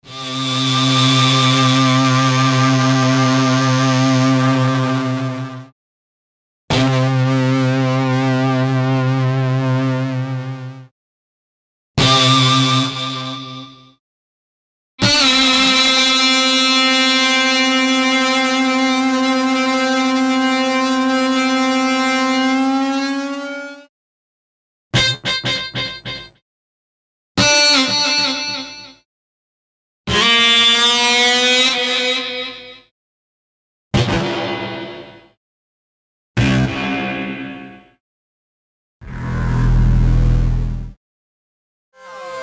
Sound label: E Guitar; Stramp Synchanger